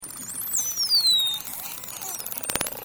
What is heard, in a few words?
bottlenose whistle